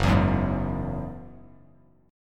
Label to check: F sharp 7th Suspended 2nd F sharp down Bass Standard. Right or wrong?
Right